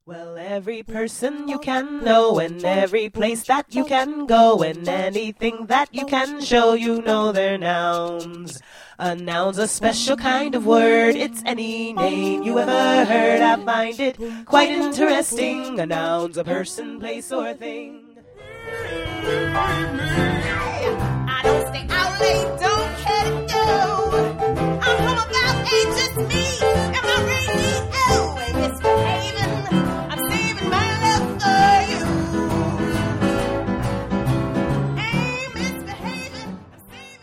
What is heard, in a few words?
Singing Sample